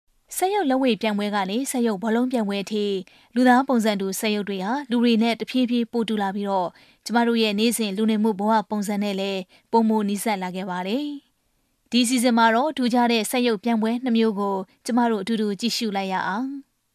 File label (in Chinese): 探秘片头音响